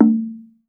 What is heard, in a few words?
TONGUE DR; TONGUE DRUM